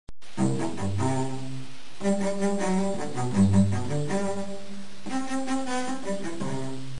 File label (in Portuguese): arpejo; musica classica